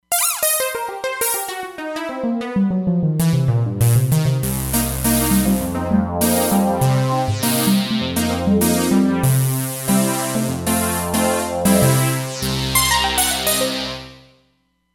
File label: Sweep Lead, Roland Fantom XR